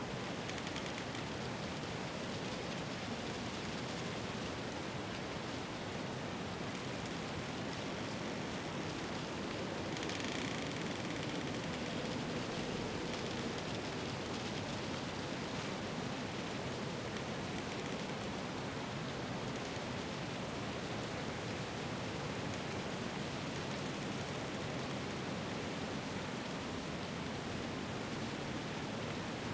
WINTER Forest wind moderate with trees rustling and barks creaking, North Laurentian Woods; Canada; DeadFright